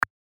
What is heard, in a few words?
ui click